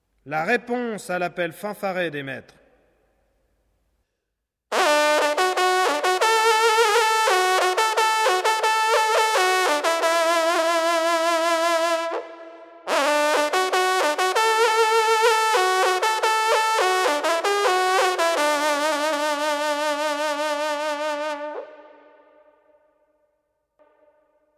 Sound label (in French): reponse a l'appel fanfare